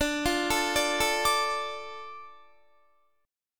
D Minor D Guitar; Dm arpeggiated; 22,20,19,19,21,21

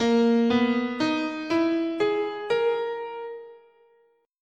Bb Iwato, scale

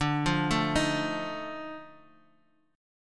F 7th D; F7/D

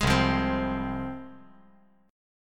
C Suspended 4th Sharp 5th, C, down, chord

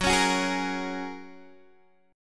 F sharp Major Add 9th, Guitar DADGAD

